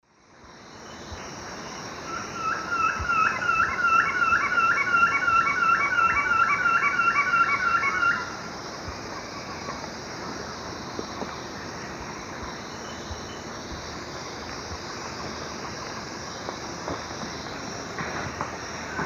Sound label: Frederickena unduligera, song; S. America; Eastern Lowlands